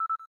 cam timer last; LG

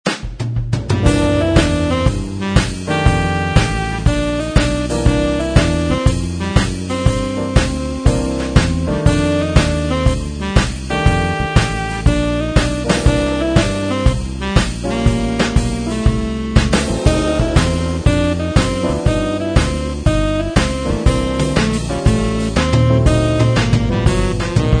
BLUES, ringtones; Axioo M5C

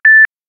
Beep once, ringtones; Samsung; Galaxy Ace 3 CDMA SCH-i679

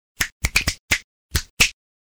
snap; Oppo; Find 3 X905

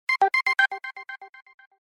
Twinkle

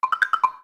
Fast, notifications; Coolpad; Note-8

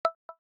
Letter, notifications; Huawei; Ascend P6

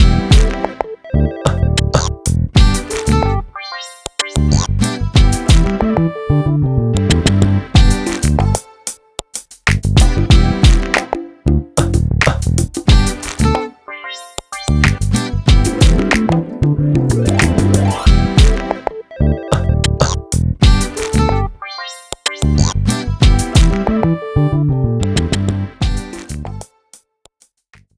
Hiphop, ringtones; Coolpad; Dazen-X7

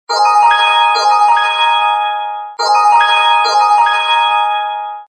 Charming Intro tone; Samsung SGH-E251C

